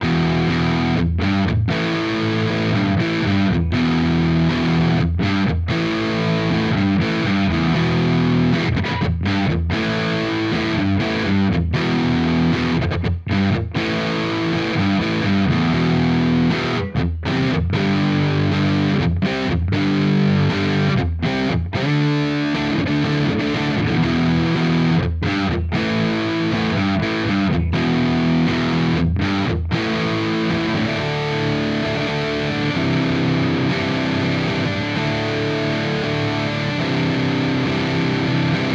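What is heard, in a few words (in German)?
Hagstrom Ultra Max Special Mid Zerre, guitar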